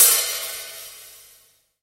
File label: openhat, drum; drum stick